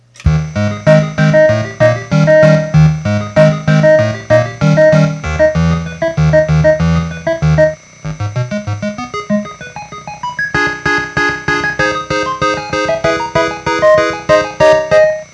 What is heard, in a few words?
Game music